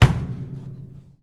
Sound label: bass drum unmuffled